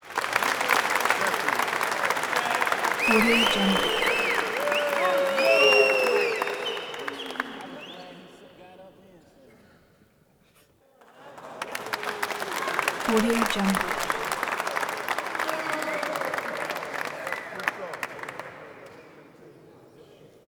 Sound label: audience applause reverberant theater; prosoundfx